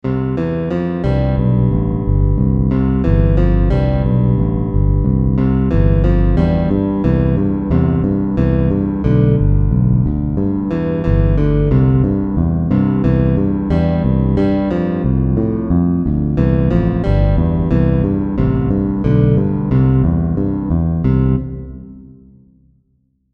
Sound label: When The Saints Go Marchin In, basse electrique